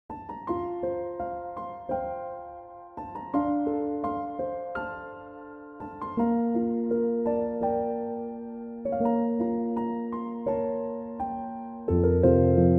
piano instrumental; Mulan

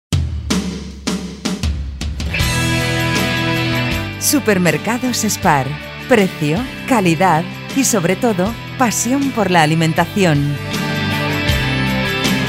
JINGLE; SPAR

